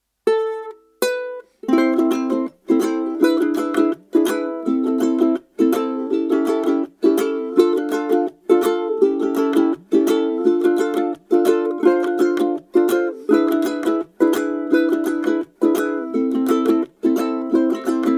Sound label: Rumba, ukulele